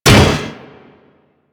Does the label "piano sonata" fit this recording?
No